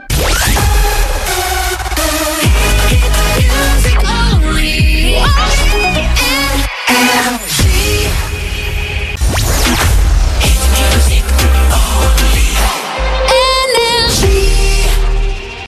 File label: Jingles; NRJ Shotgun ID (2025)